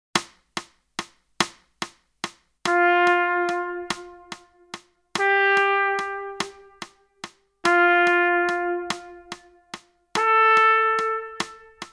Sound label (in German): Echo Ubung